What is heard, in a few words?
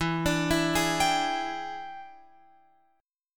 E 7th Sharp 9th Guitar Open F, chord; E7#9; arpeggiated; x,11,14,11,11,14